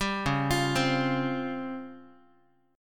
C sharp Major 7th Flat 5th Guitar; C#M7b5; arpeggiated

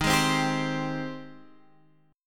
Ab Major 7th Eb

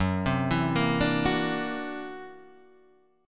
C 9th Flat 5th F sharp

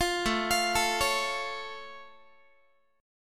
A Augmented A down Guitar; A+ arpeggiated; 20,17,20,19,17,x

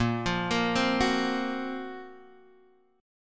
F sharp Major Sharp 11th A sharp

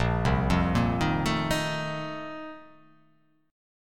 A sharp Major 7th Suspended 2nd Suspended 4th

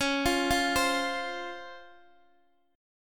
Db Major 7th, Guitar, Open D

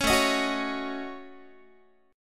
A 6th Flat 5th A Guitar, chord; A6b5 strummed; 16,17,18,16,17,x

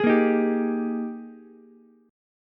Ab Major 7th Suspended 2nd Ab Ukulele; AbM7sus2; 13,10,11,10; strummed; chordshape; JGuitar

